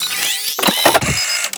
Camera Consumable Equip; Warframe

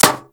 Bullet Impact Metal; Warframe; Bullet Impacts

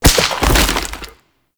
Bunraku String Drop; Warframe; Koumei

